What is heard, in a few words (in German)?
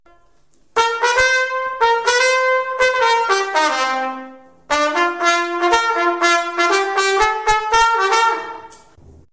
trompete